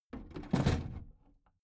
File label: big plastic case lid put down soft